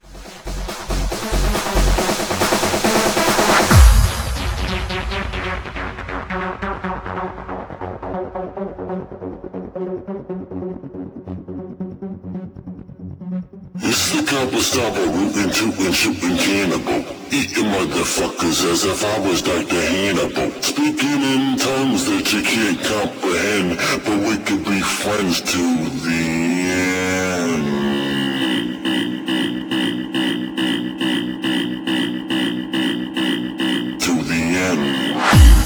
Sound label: Electro